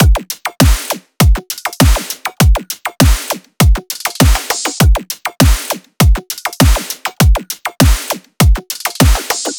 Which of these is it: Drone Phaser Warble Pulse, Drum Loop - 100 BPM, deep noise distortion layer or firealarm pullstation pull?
Drum Loop - 100 BPM